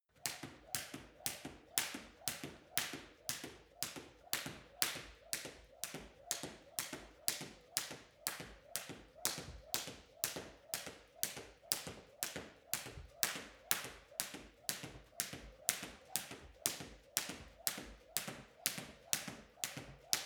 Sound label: skipping jump normal long